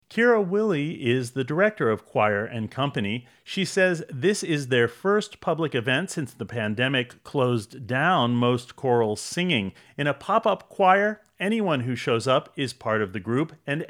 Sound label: pop up choir